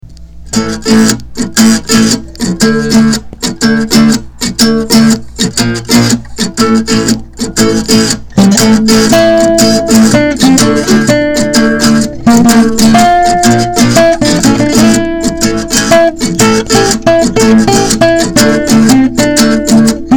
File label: acoustic